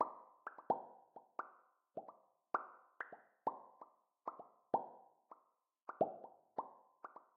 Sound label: Heart Neon; Game rip; MiSide